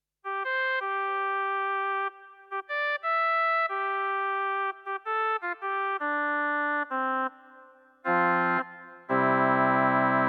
SP5100 Woodwinds

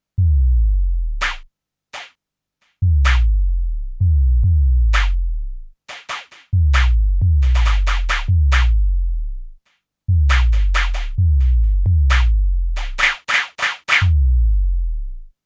Roland TM 2 Super Low

